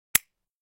Slide open; Nokia; 5300 XpressMusic

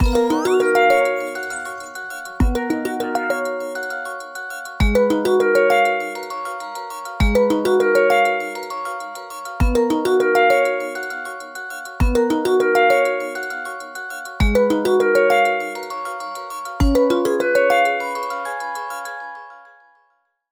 Mechanical Lock; Honor; X7C

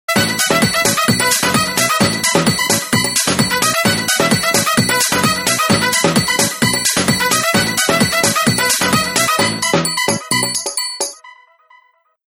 Trumpet, Sounds; Sony Ericsson P990i

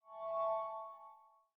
Folder Open, ui; Samsung; Galaxy C7

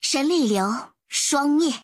Notification; OnePlus; Ace 6T; Genshin Impact; Kamisto Ayaka

